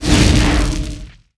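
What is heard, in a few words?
Cast skill Hawk Talon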